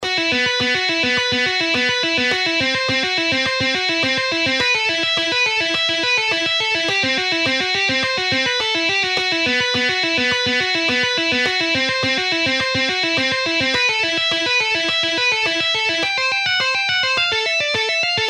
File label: Guitar Dynamics